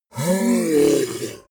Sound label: yeti growl angry